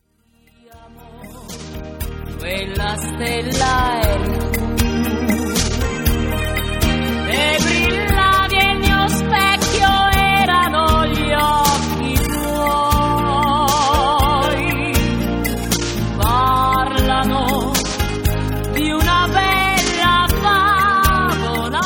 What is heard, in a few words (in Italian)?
dolce tango